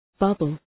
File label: bubble